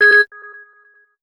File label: Flip Phone, notifications; HTC One M9